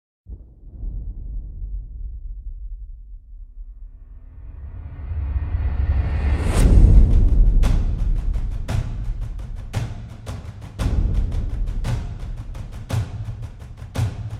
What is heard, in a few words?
drums fx